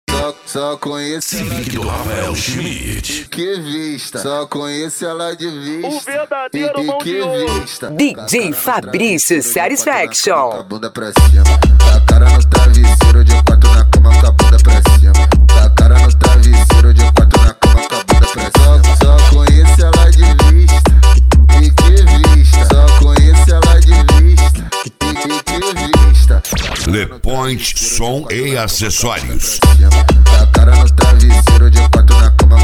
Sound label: funk mega bass